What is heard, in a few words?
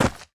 Steps Dirt Falling